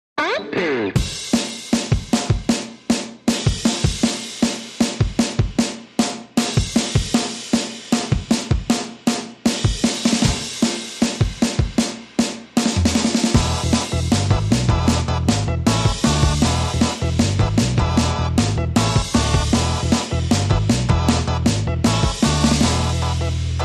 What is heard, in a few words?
No Lead Guitar